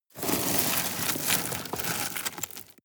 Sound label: sweep small rocks